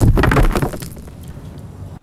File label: heavy boulder impact and scattering stones; rocks